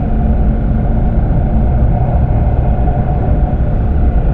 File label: cryo teleport; Quake 4 Alpha; id software